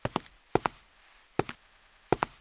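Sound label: footsteps; tomkroky; Mafie 1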